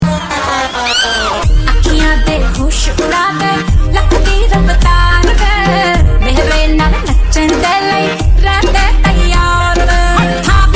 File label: Punjabi song